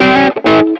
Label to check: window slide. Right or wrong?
Right